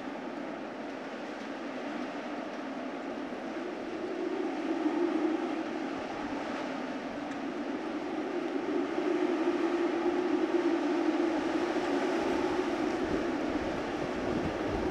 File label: Wind Howling; zps; Ambient